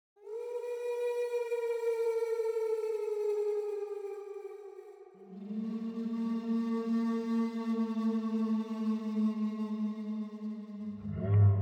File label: Fantasy Creatures